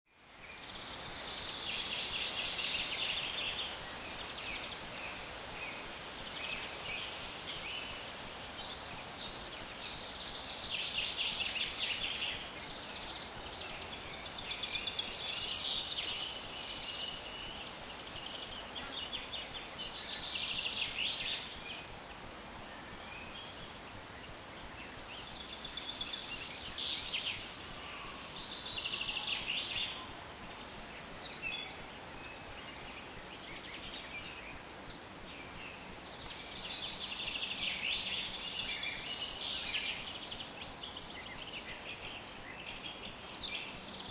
Birds In Light Forest Daytime, Breeze through trees, light forest ambience, occasional insects; Breeze through trees, light forest ambience, occasional insects buzzing by. Long sound, 5 minutes.